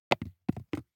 DICE on Wooden Tray, Throw and Roll, Foam, 1 One Die; Samples; ArticulatedSounds; DICE Sfx Party (Extended)